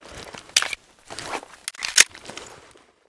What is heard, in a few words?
glock18 reload